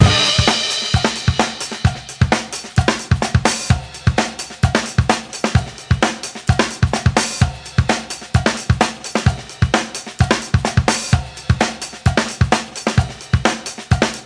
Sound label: Action Beat Break; bully scholarship edition